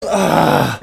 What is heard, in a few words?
aaahhh